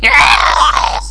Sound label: death; potator